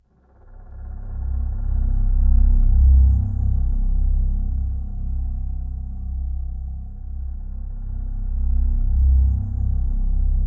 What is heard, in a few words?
C2, Synth Leads